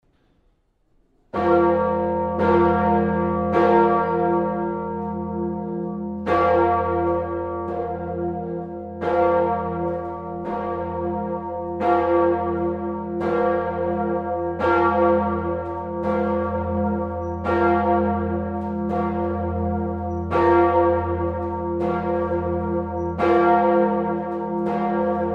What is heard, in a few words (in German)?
Jubilaeumsglocke